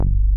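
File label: LOW PAN bass, JV 1080; Pro-Rec - Synthsonic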